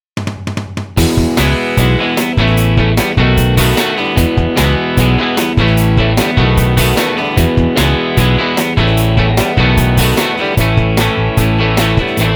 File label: patch demo